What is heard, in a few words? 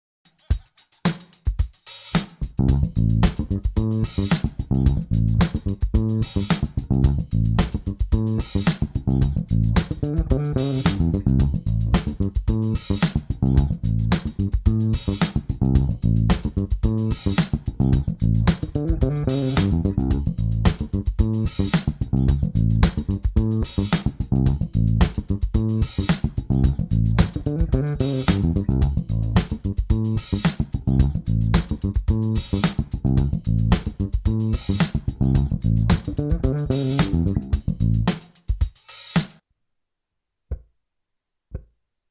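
A7 groove with fill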